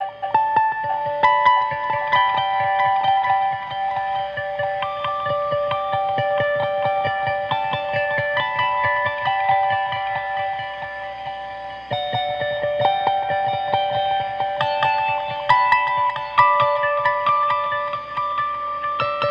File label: Major Key Melody lower drive, guitar; cascading delay guitar effect garageband